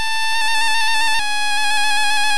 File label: Perish Song; sfx; attack moves; pokemon; gen 2; gsc